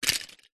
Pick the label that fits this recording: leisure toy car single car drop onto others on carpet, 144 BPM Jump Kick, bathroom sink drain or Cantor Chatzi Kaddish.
leisure toy car single car drop onto others on carpet